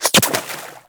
Bullet Impact Water; Warframe; DE; Weapons

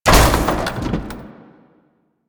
Common Military Crate Destroy; Objects; Warframe; Storage Container

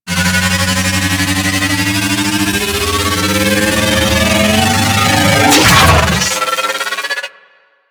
Laser Charge Up; Ambience; Warframe; Orokin Moon

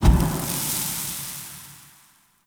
Soft Flame Burst; Ambience; One Offs; Champion Crypt

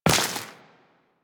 Step Flesh, Footsteps Walk; DE; Warframe; Tenno

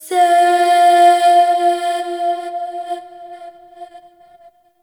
Opera Note; Warframe; Ambience; Orokin Moon; Moon Int Opera House

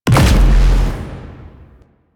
Shotgun Proj Explo; Weapons; DE; Warframe; Tenno; Pod Shotgun

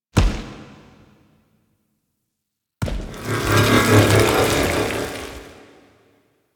Qorvex Idle Noble Speargun; Warframe; Warframes; Idles

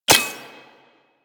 Pistol Mech; Warframe; Tenno; Guandao

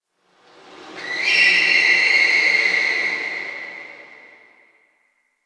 Distant Car Squeal; Warframe; Ambience; Nineteen Ninety Nine

